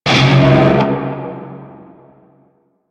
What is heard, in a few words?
Shawzin Hirajoshi Chord D; Music; Warframe; Narmer Shawzin